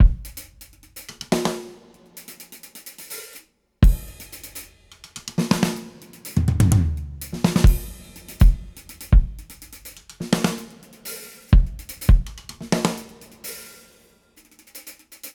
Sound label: Live Full Drums, 125